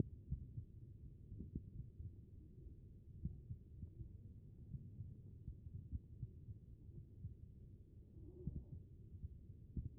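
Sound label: HEART, heart sound; B MODE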